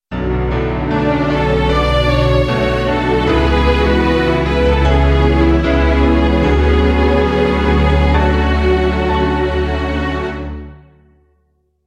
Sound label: sad, jingle